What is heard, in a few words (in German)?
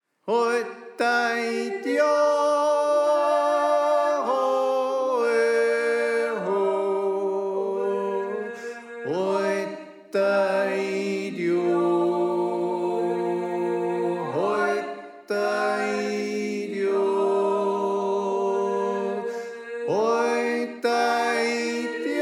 Tenor